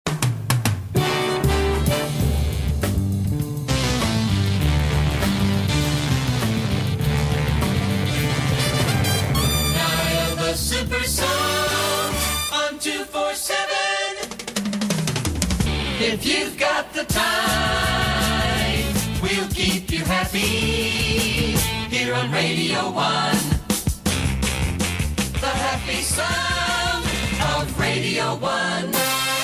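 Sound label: 1970s jingles; pams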